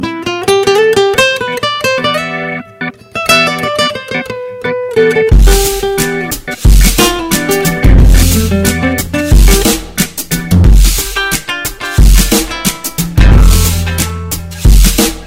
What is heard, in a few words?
abstract music